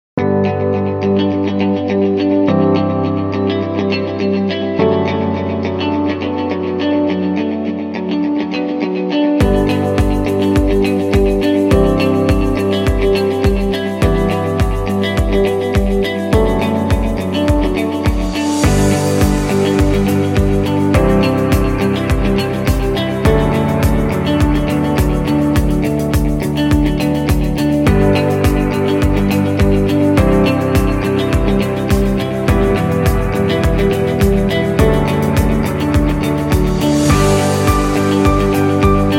Ambient Background, corporate music; corporate music vol 16

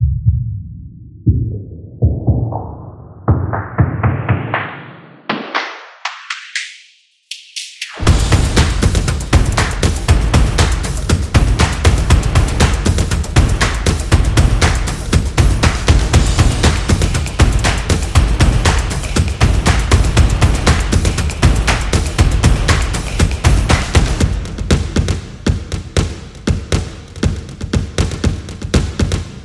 Stomps Energy, energetic percussion

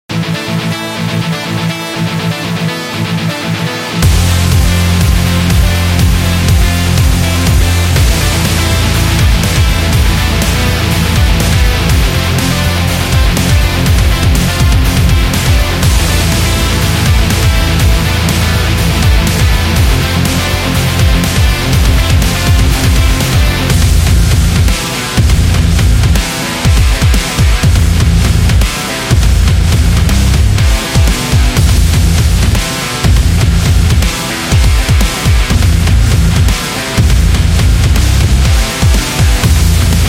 Gym Rock

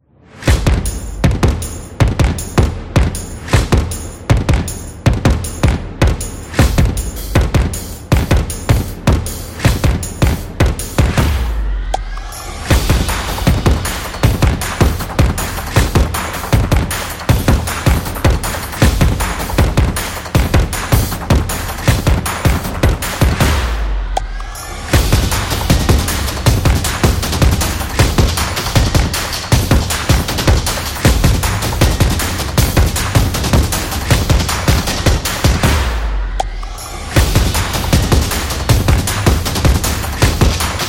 Basketball Court, football music

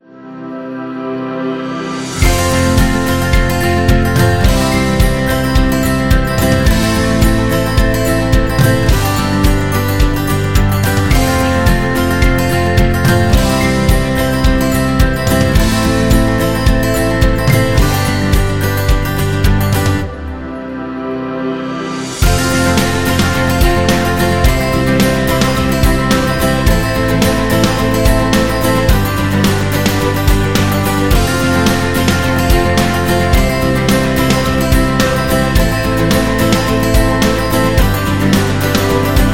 Guitar Motivational

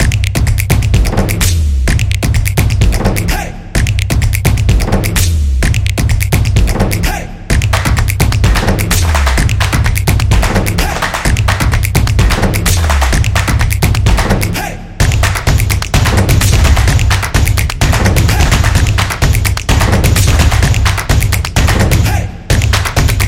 Fun Beat; football promo

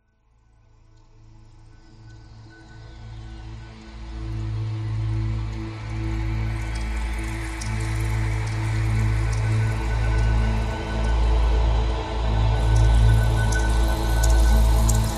Mysterious

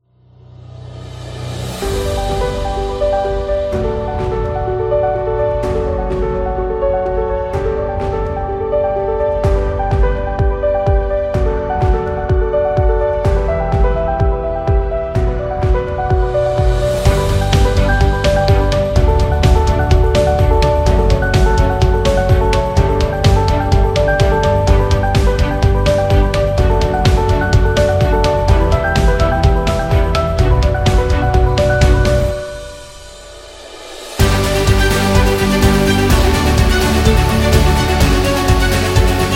Motivational Dynamic

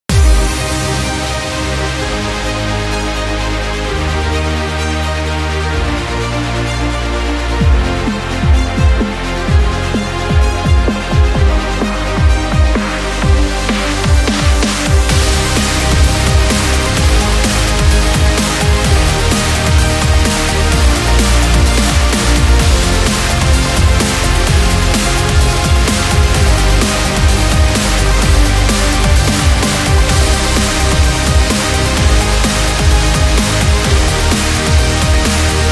Powerful Epic; epic sport metal

